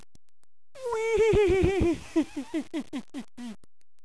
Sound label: heehee